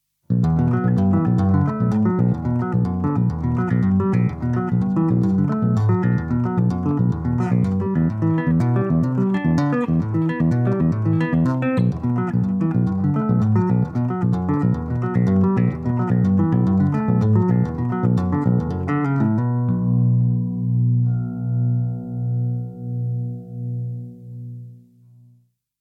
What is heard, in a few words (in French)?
TAPPING CLEAN; Damien Elite